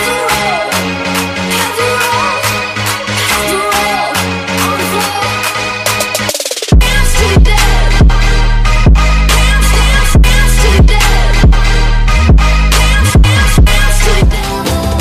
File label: latest beat